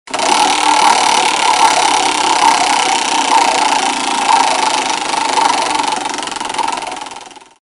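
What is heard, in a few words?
sounds of casino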